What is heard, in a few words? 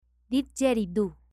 Didgeridoo